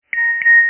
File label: sms; Air724ug Lora